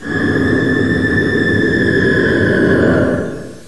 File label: dream scream; an unearthly scream; a sinister voice speaks and you hear an unearthly scream